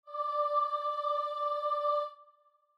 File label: starting note, voice; a joyful acclamation